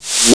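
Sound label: 150 reverse, Big Beat Drumloops; USB Soundscan vol.25; AKAI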